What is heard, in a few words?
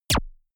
gun shot silencer synthesized zap; schusswaffen